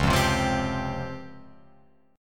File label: Db Major 7th Flat 5th Db down Guitar Open F; DbM7b5; strummed